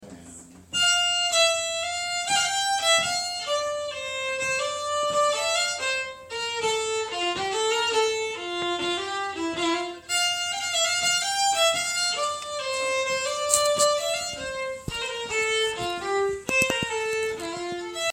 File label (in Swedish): Glasögonpolska